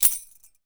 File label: YAQUI; AKAI S6000 CD-ROM - WorldSounds